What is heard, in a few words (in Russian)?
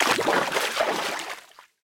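мощный всплеск с бурлением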